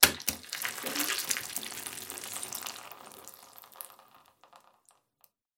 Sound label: xen dripping; ambient; Half Life Alyx